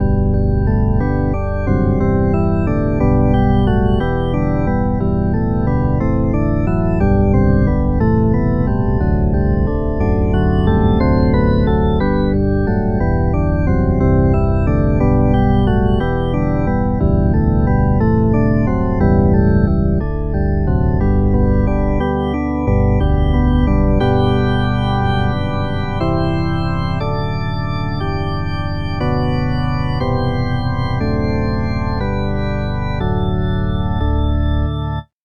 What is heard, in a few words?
drawbar organ